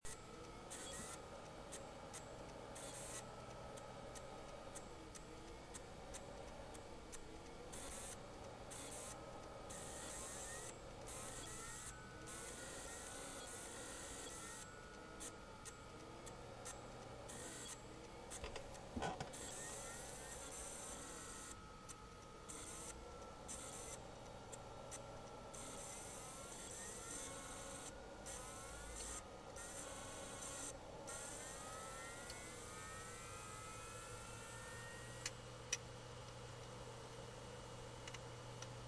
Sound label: the sounds of a wonky hard drive